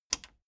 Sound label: plastic button latch loose push enable